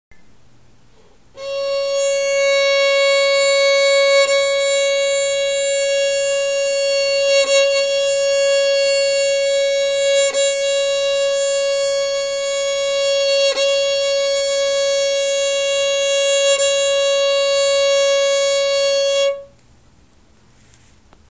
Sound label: csharp, violin